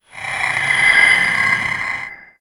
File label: Marker Tag Down Load; KI